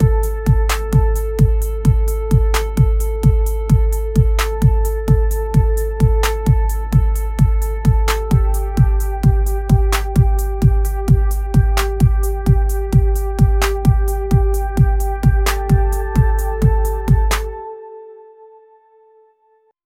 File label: audio for a trailer, beats